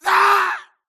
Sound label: grunt death crush; Titanfall 2